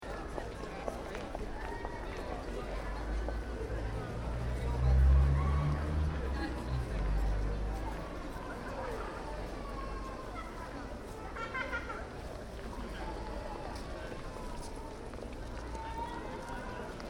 Town (Small) Ambience, People, Slight Traffic In Background; Outdoors; Backgrounds; Outdoor Sound Effects; Stuart Duffield